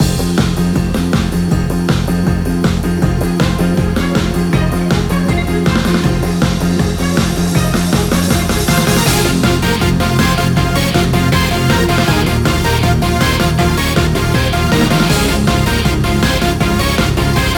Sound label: battle